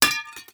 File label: glass hit; teardown ps5 windows xbox series xs gamerip 2022